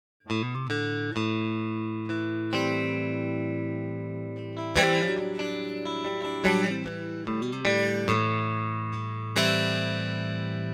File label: Clean Piezo Amp; Archetype Petrucci